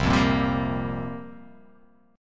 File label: C 7th Suspended 4th, chord